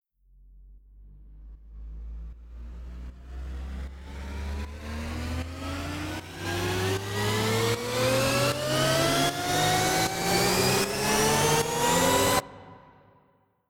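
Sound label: FutureBass Mix FX Riser Main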